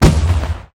MAINBOSS ATTACK; guns n zombies 2014 pc

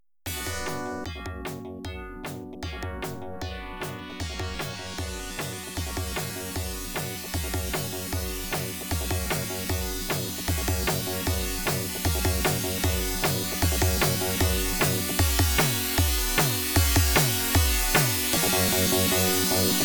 Catwalk Timer, pinball

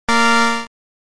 End of the Credit Inserted Sound (SFX)

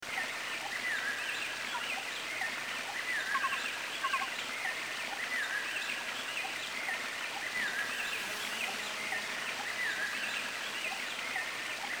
Ambience; Kolibri; 32x

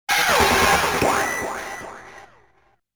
Player Out

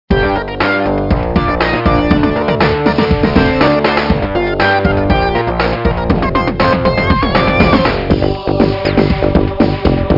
Disco Heavy

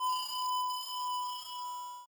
SFX Resurrect

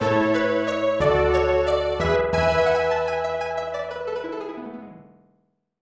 Item Gained; arabian nights snes

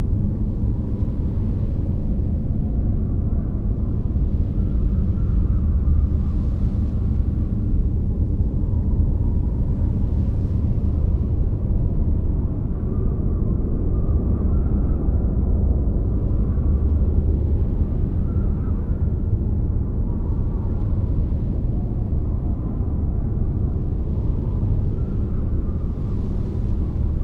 Ambient

